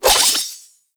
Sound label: Spectral Sword Slash Start; Ultimate Abilities; Naramon; Warframe; Focus